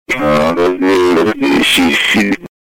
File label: e scale